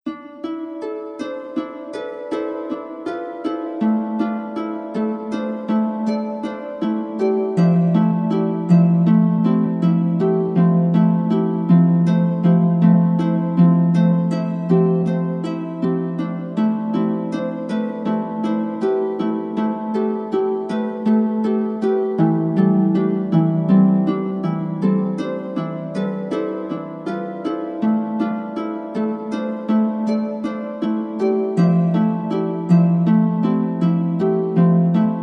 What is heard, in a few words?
magic music relax 432 Hz; magic memories